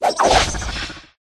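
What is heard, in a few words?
leprechaun attack up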